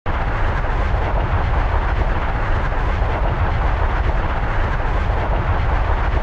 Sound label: Tornado Loop